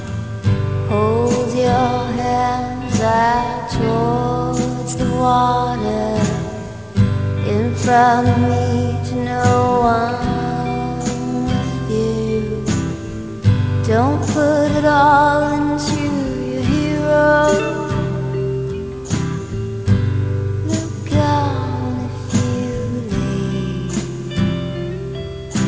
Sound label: bells ring